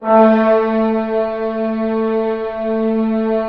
orchestra A2 PP; Sound On Sound - Peter Siedleczek's Orchestra

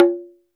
SMALL DRUM, CHINESE PERC; Spectrasonics; Heart of Asia

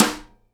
SIDE STICK, Live Snares

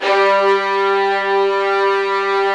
Virtuoso Strings

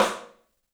STEEL, Drums, ROOM SINGLE; 3D Sound

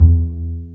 Cb Pizzicato, String; Cb Pizz 1; Roland - String Master Series

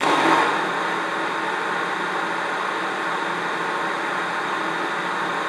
luft, alto sax; Xsample VOL-2

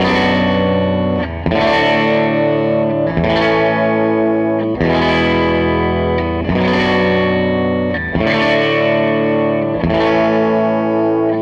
SG Guitar Loop

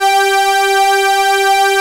JUNO STR 2 G4, Juno 106; Greytsounds; JUNO 106-60

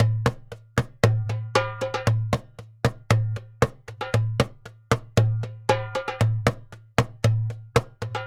Doumbek, Arabic; Sonic Foundry (Sony Creative Software); Continental Drift Aftershocks

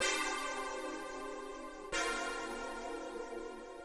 125 Soft pad C, Keys, Nu Jazz House; PowerFX - Nu Jazz House

